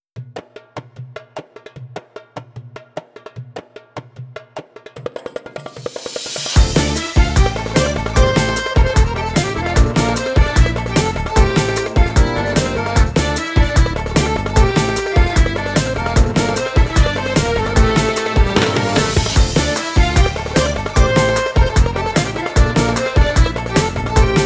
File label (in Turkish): arabesk oryantel; by burak sound bank; arabesk oryantel vol 2